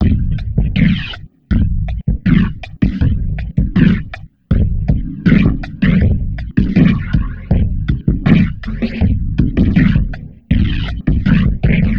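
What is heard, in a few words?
Loop, beats; Sonic Foundry (Sony Creative Software); Esoterik Beatz; Quesy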